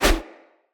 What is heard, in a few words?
Husk Whoosh, Unarmed; Weapons; Enemy; Mockery Husk